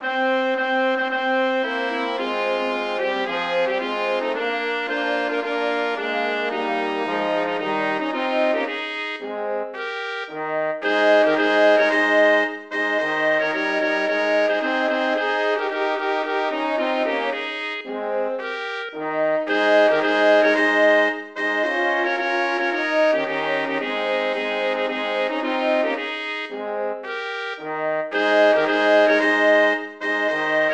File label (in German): marsch; Björneborgarnas